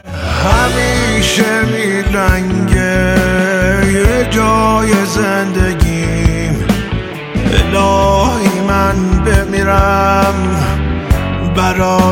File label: Ringtone